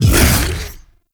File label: Bowl Jump A; Warframe; Grendel